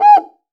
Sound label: F-CUICA HI; AKAI S-Series CD-ROM Sound Library VOL-5